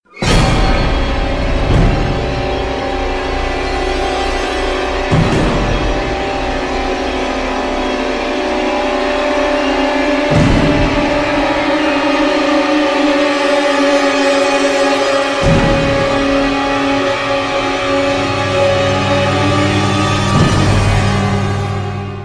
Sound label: alien victory, music; morbus